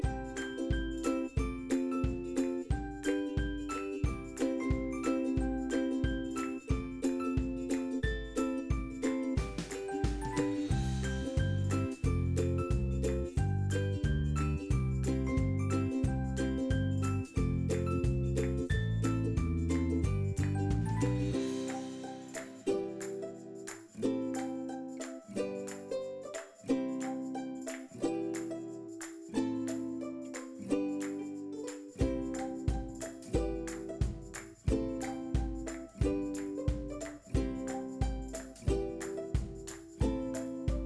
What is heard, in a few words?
music cheerful; bgm